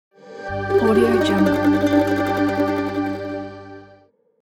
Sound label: arabic logo